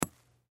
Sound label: Bullet Shell; Bullet Shell 7mm Drop Sand; Drops (Sound Effects:Objects); Blastwave; Warfare